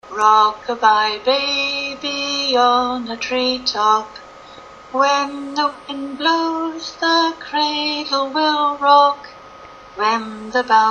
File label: Rockabye baby comptine anglaise